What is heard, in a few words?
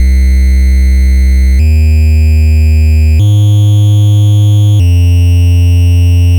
NES Bass, chiptune